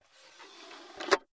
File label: Tripod Leg Telescop